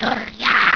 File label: pain; alienarena; grey